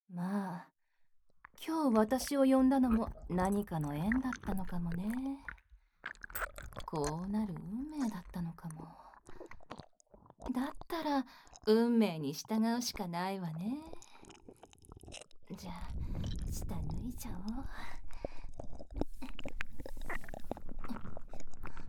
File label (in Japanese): 手マンで潮吹き 乳首舐め 正常位 パイずり 騎乗位 音声